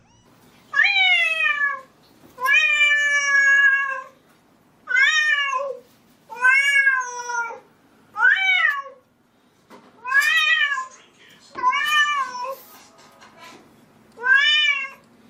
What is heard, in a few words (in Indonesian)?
suara kucing minta makan; burungpedia